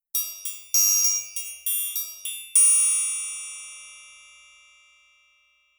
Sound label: triangle